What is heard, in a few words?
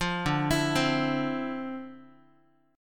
D Minor 9th, down, Guitar; Dm9; arpeggiated